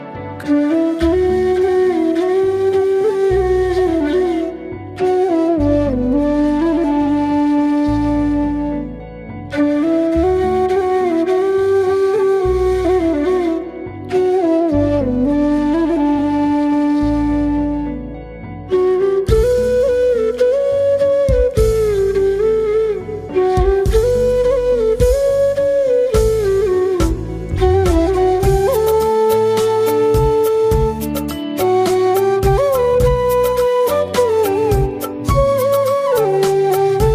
Flute Ringtone

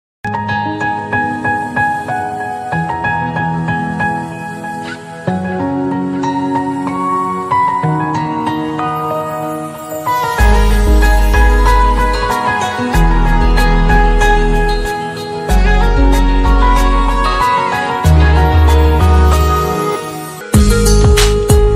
Most Romantic Instrumental Ringtone

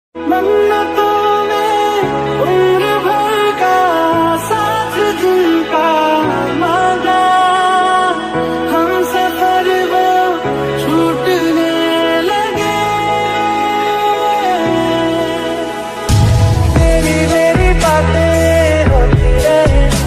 Lofi Hindi Ringtone

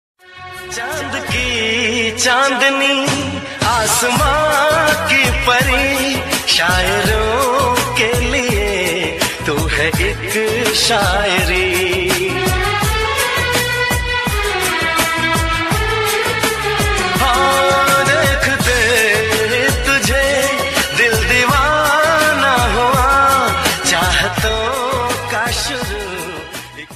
New Hindi love Song Ringtone; ringtones

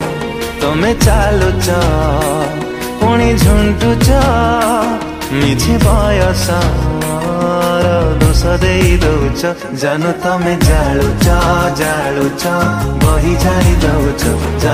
Odia Ringtone

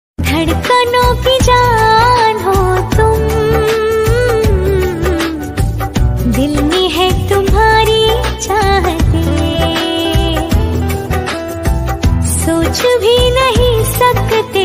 Female Ringtone

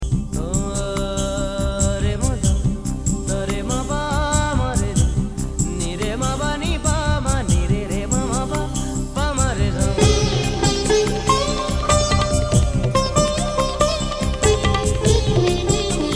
Sad Music Ringtone

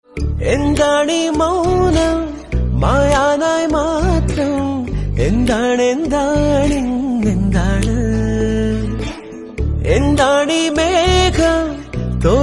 Malayalam Ringtone

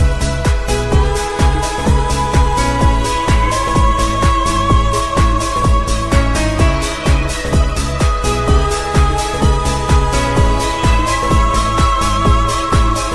Hollywood Song Instrumental Ringtone for iPhone

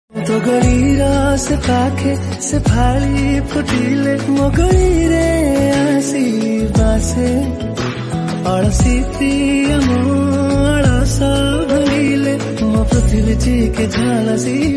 Odia Love Song Ringtone

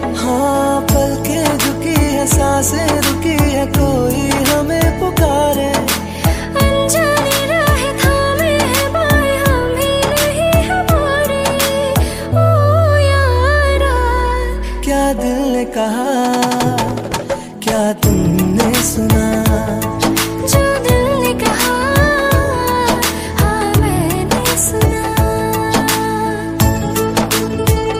Love Song Ringtone

